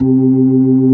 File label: B3, Drawbars